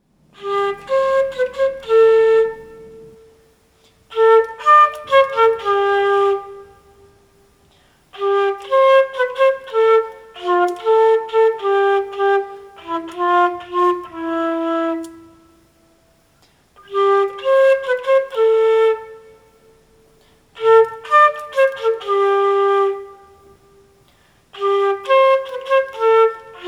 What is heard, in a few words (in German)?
Querfloete